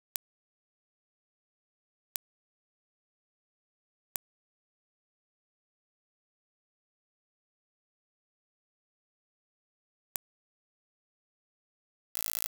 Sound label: mic concat health check; mars2020; edlcam